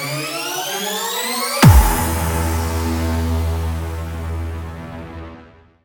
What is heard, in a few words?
Ending Stinger; Scraprun